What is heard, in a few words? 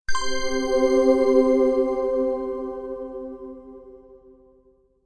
Galaxy S4 Notify